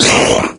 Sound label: pain, maggot; doom3